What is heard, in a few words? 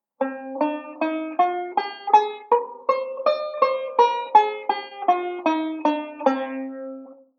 C Aeolian; Beyond Chord Melody Volume II